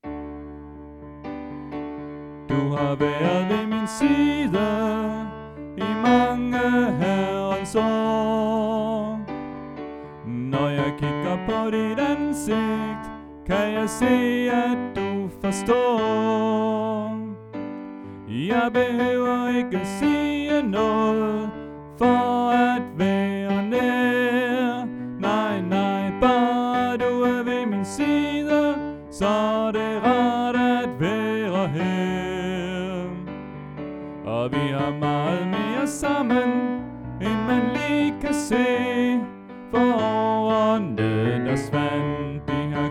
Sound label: Bas; oevefiler